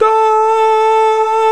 VOX, Male Scats

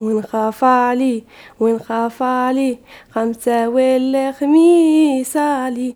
YOUNGIRL, Arabian Traditions; USB Soundscan vol.43; AKAI

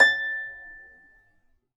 A5 HARP; Complete Harps; USB Soundscan vol.62; AKAI

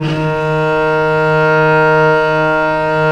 VL D#2, VIOLE, Historical Instruments; USB Soundscan vol.23

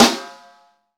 snare, Roland

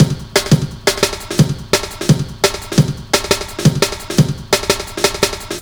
172 LOOP, Explosive Jungle; USB Soundscan vol.05; AKAI

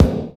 BD, BD Dance

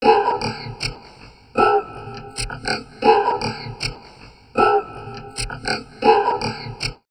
VOCOD, Drum Loops Crazy Processed

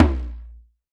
Talking Drum Down, Percussion; Roland BK-5

